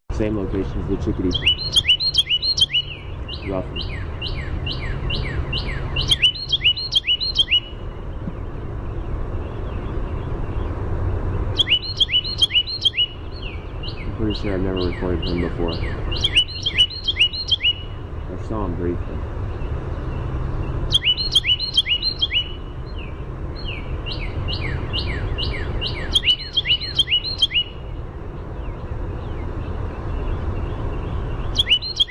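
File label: Carolina wren song, Thryothorus ludovicianus; Passeriformes Troglodytidae; TX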